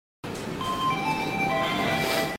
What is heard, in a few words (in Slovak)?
Stanicna znelka; Budapest